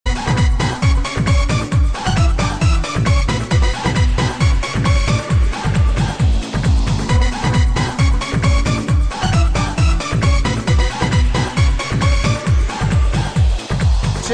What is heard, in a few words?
Bengali Music